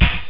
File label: Tube drum; jazz jackrabbit 2; M8